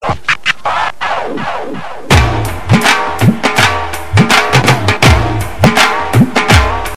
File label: Dhol